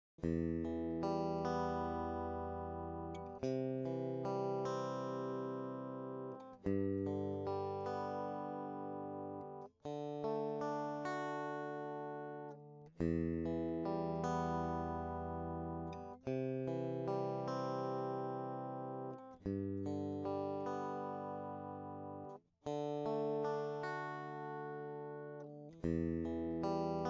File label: strat, guitar